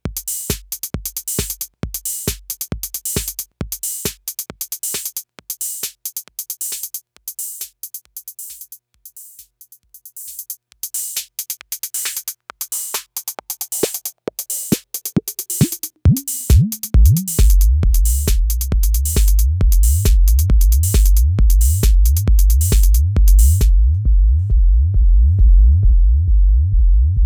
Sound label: 808 res